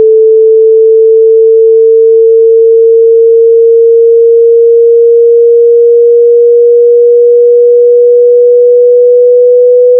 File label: Sweep A2B